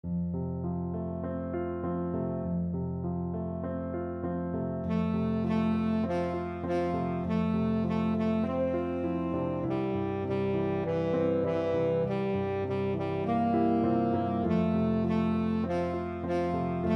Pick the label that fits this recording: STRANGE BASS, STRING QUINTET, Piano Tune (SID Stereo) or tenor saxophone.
tenor saxophone